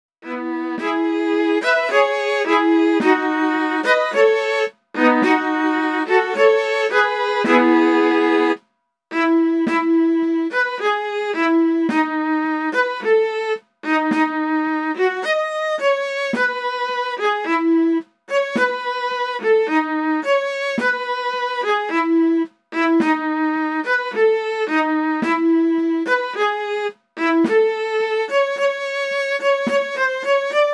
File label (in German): midi 1-stimmig; haderlump